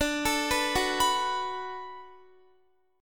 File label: D 6th D down Guitar, chord; D6 arpeggiated; tuning 4E,4A,5D,5G,5C,6F